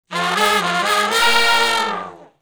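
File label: Shuffle Blues Riff (Bb) unison falloff w growl, Horncraft for R&B Sections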